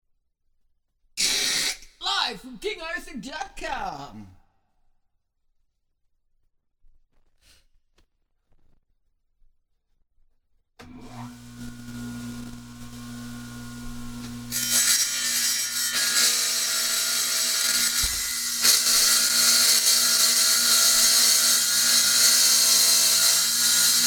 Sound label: wet saw